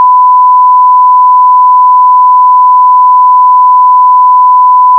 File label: 22KHz PCM16 Stereo 1KHz Tone